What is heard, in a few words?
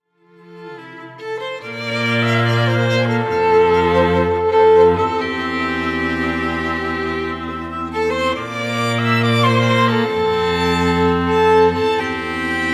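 strings, String Quartet, Trio or Duo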